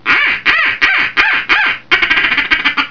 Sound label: duck call